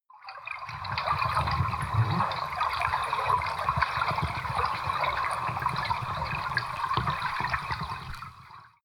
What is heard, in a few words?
canal